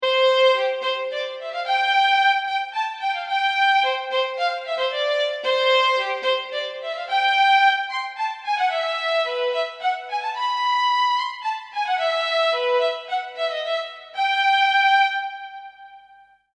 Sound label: violin xv5080